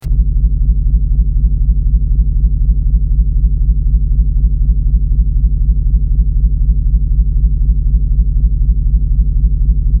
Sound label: low rhythmic pulsing soun; throb